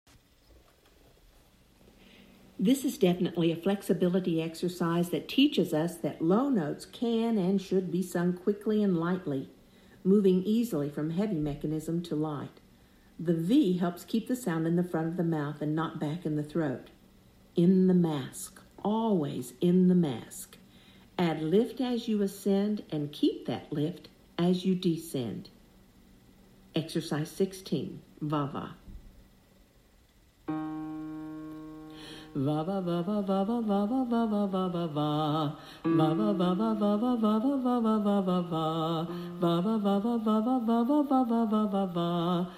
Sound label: Vocal Warmup Va Va